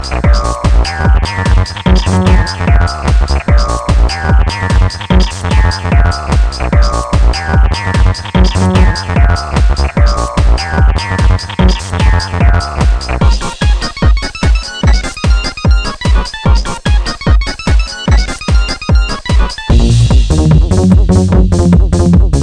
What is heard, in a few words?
Sick Bass